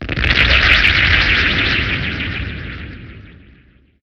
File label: weapon fire